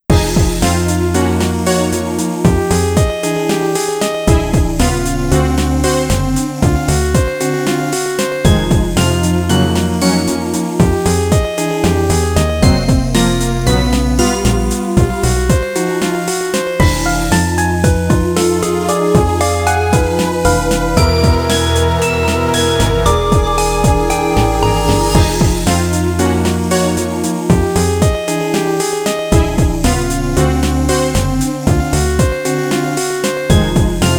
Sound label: Tension; ryuusei no rockman 1 2